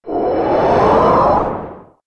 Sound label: superjump build